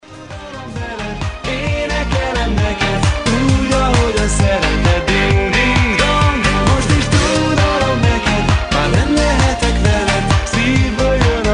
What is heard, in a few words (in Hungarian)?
Ding Ding Dong csengőhang; vicces